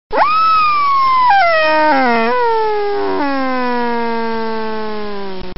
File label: dino roar